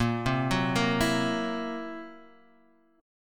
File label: Fsharp 7th Flat 5th, guitar chord